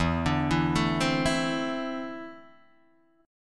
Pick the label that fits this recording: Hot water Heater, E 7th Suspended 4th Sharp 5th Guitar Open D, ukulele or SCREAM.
E 7th Suspended 4th Sharp 5th Guitar Open D